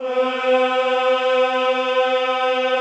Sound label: CHO, Roland